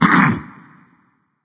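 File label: nonverbal clear throat; smite; voicepack; merlin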